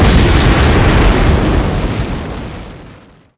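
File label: Explosion Large; SFX Kit; Destruction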